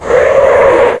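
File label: skid cement; Millennium Gold 2000; Monster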